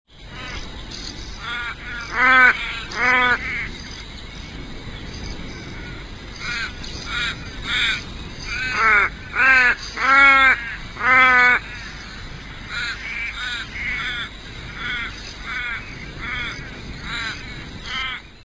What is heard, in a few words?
little raven